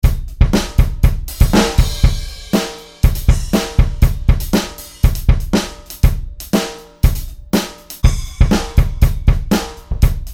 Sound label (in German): Rock Style AD Kit 4 verschiedene Drummer